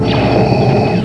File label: Breath; Star Wars